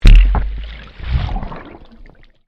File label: Underwater Splash Impact Extreme, Submerged impacts created by dropping a weighted rope into; Submerged impacts created by dropping a weighted rope into the ocean